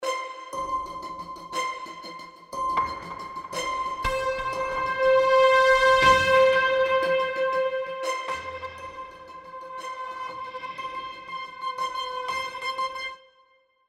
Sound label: SD Loop Designer SD Triplet All High